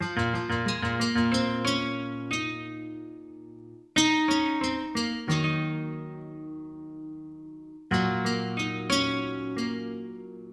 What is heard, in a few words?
Hip Hop and R&B Drumloops